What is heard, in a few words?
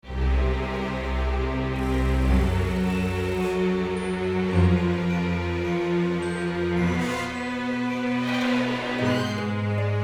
Horror Suspense 10 bars